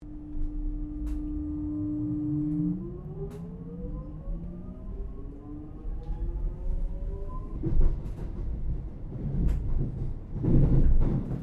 Train Melbourne Passenger Xtrapolis 100 Interior Long Stop; Trains